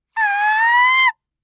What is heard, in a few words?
kyaa; gaya